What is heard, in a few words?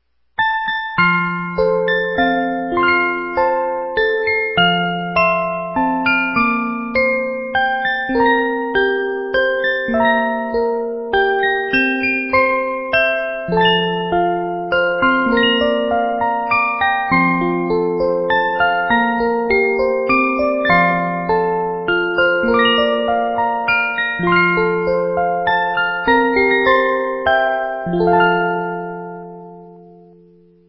Brahms Lullaby, 30 Note